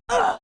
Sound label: Spell Damage